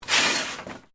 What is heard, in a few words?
METAL SCRAPE SMALL; Sound Effects:Materials